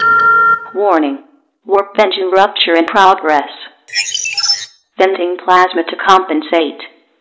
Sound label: Comp Burst, Plasma; SpecialFX; NanoFXv2